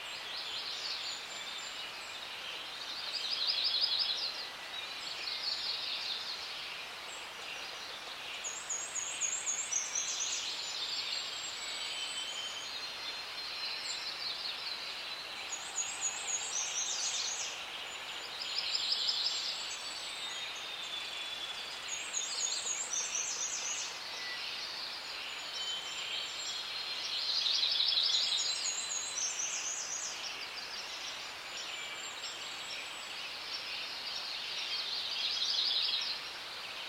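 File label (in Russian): звонкое мелодичное успокаивающее пение лесных птиц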